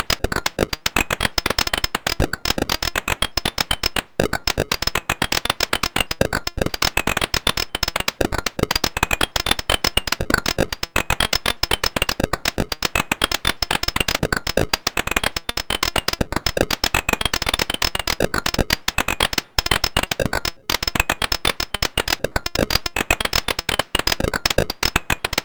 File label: click and clack